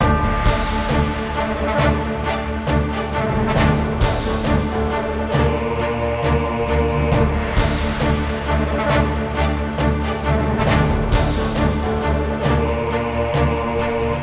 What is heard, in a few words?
Wizard Battle; Lego Battles 2009 NDS